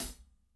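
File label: closed hi hat, right; Spectrasonics; Burning Grooves CD2; HIT ME KIT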